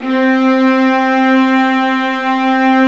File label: STR, Vas Bright; Roland L-CD702; Vlas Sect1-2